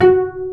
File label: PIZZ CELLI F2; E-MU Formula 4000 Series Vol. 5; Protozoa; Proteus2 Instrmt